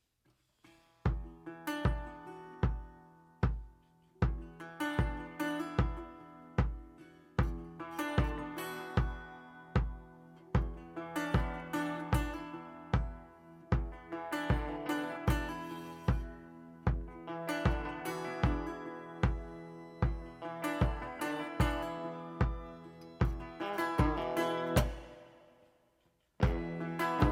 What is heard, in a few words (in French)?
accords